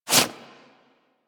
quick foley; characters; generic foley; Warframe

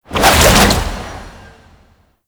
Infested Tendril Whip Long, melee attack; Zeke; Warframe; Infested Lich

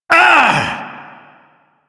Pain; New War; Ballas

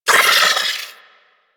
Sentient Battalyst Vocal Pain

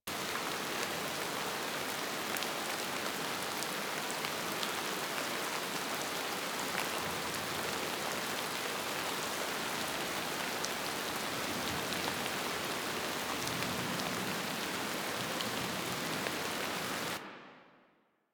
generic rain loop; Ambience; Props; Loops; Warframe; Eidolon Plains

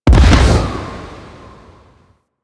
Void Comet Appear; Warframe; Void Storm; Railjack

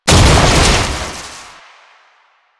Scythe Proj Die; Stalker Jade Scythe

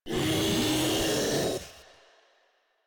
Infested Ancient Vocal Attack; Warframe; Proto Infested

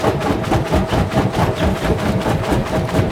Room Fan Loop; ambience; props; loops; Hiest; Warframe; Venus Open World; Venus Solaris United

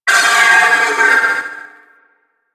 Trooper Vocal Death; Warframe; Sentients

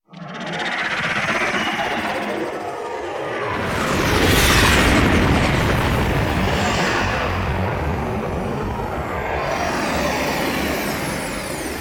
Relay Intro Docking; Blue Sky Liset; Vehicles Flight

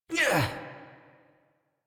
Exert, Adult; New War; Melee Swing; Operator

